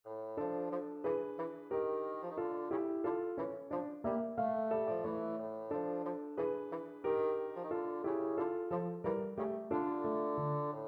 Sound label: grandmother tells a ghost story, bassoon